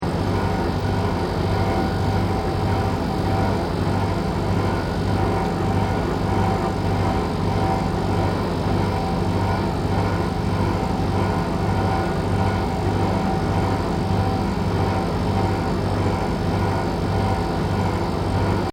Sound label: Vending Machine Compressor Loop, Japanese vending machine; Machinery (Sound Effects:Machines)